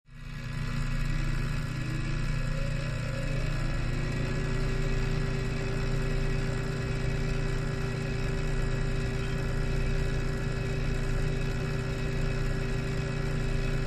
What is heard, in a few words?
Constant Buzz Water